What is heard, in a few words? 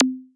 draggable Drop; feed the frog